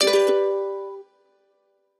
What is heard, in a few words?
multiline win; Hot Party Deluxe; Wazdan